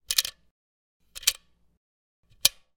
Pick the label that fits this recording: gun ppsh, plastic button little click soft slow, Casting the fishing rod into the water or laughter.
gun ppsh